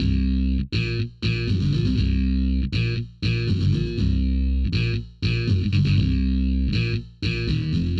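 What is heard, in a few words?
Ambienza Bass Line 120 C minor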